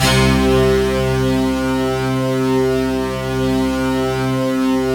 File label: BIG POWER, HEAVY SOFT; Sound Gallery - Charged